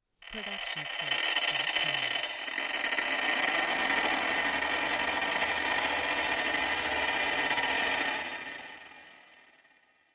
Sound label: horror creak; soundscrate